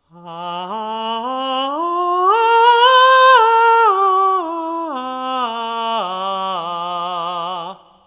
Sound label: falsetto